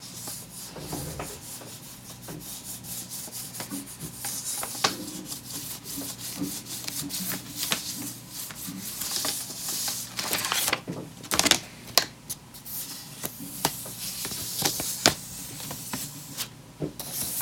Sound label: Creased Paper